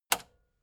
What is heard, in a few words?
metal button spring click fast